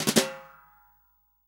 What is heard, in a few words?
SD, REAL DRUMS, SWING KIT